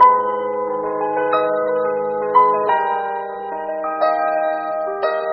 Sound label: piano, Loops Sad